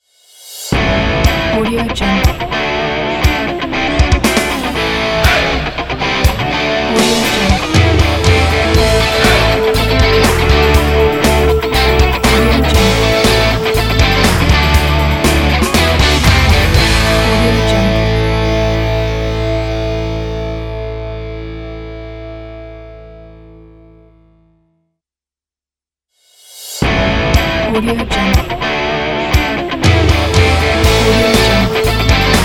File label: road rock logo